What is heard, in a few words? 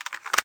reload pistol; plague